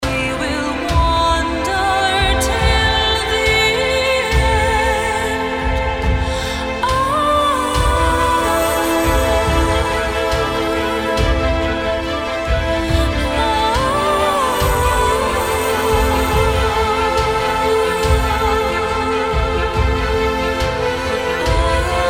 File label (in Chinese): where the sun leads, 电影EDM多风格空灵人声采样包; Ghosthack - Shymer Ethereal Vocal Collection Volume 2